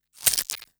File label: Glitch, noise